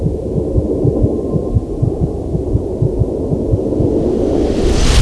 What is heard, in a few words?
knife flash; knife v2; secondary